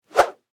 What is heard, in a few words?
Whip Whoosh Extension, Foley, Whip, Whoosh, Extension, Cable; Whips; Objects; BlastWave